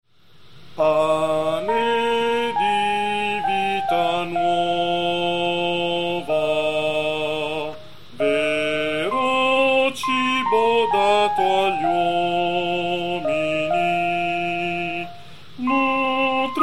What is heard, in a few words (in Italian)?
bassi